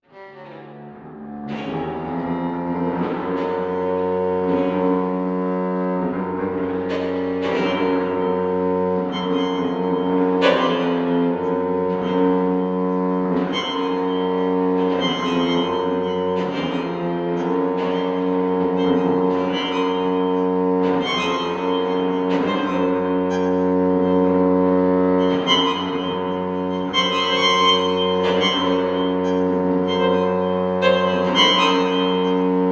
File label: Strings; Xenakis